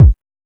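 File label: HSE-BD, house bass drum; X-Static Goldmine 1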